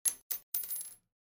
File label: Gun Shell Drop; soundscrate